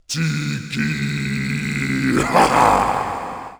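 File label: tiki laugh; hawaii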